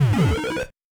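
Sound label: box break; modern warfare 2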